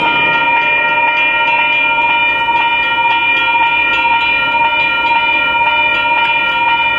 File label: Bell Ring